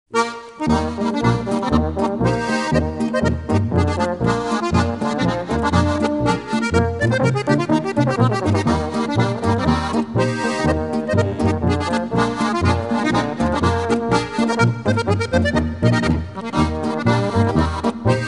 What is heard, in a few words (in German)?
Volksmusik